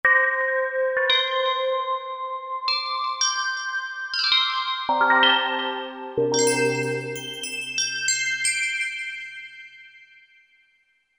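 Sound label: finger cymbal; Korg MSC-10S Ethnic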